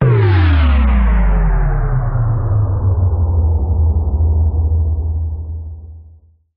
Impact; impact samples

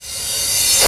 REV CRASH, Drums; USB Soundscan vol.11 - Drums Dance & Groove; AKAI

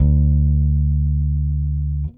Whole Notes D, Bass; Bass X

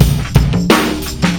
Killa Down Tempo, Drums Beats

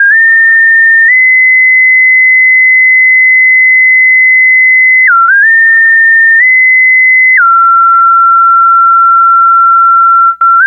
SYNTH LEAD; Keyboards; Leads

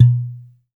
Thumbed Bottle, household rhythm samples hits

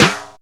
Flange Snares; Best Service ProSamples vol.37; Dance Synths; Percussion

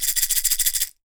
PEYOTE; AKAI S6000 CD-ROM - WorldSounds

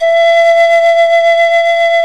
SIKU VIB E3; The Andes

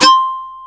DS8 C 3, Korg

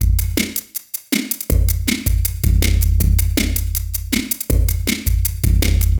Noise Beat; Drums & Drones

